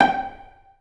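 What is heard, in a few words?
solo pizzicato, Strings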